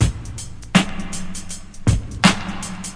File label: 081 bpm verby snare and clap